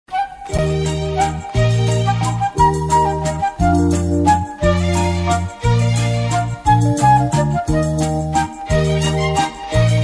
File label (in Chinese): 音乐铃声; 钟声; 广播室铃声系统